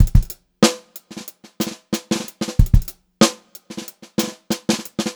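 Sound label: groovy variation, Funk-Groove Drumloops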